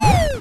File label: hit